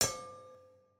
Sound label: ambience room polygon hammer training